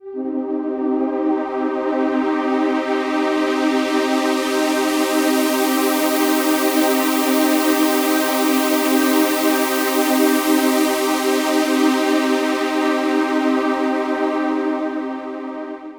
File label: Pad, Trance